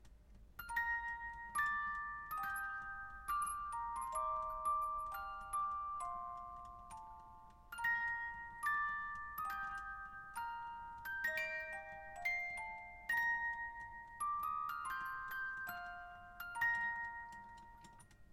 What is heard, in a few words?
Hymne Portugal